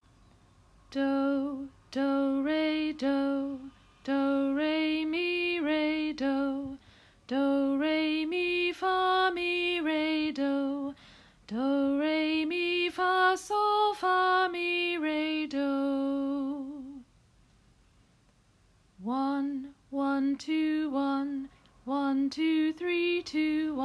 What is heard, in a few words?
Solfege learning